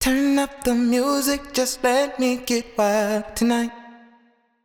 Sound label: Sung Phrase Wet - 130 BPM D# Min, Vocal; Turn Up; Cymatics; Euphoria Vocal Sample Pack